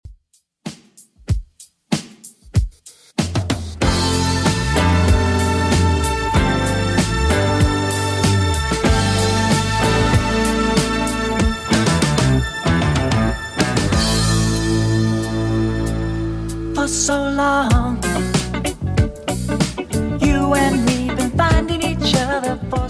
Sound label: 70s Redrum 95 bpm CLEAN